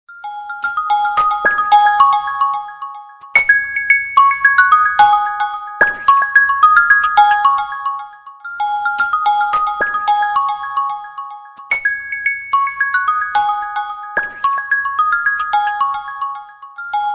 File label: Vivo Original Ringtone; Vivo V19; Vivo Phones